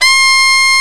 C5, REED; AKAI S-Series CD-ROM Sound Library VOL-8

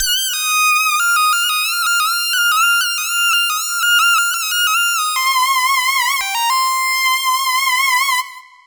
Lead Synth Lick; Dr. Fink's Funk Factory

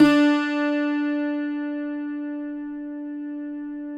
CUATRO; Spectrasonics; Hans Zimmer Guitars VOL-2

